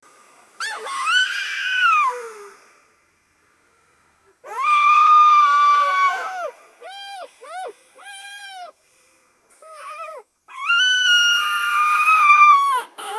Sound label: mother in labor screaming; emotions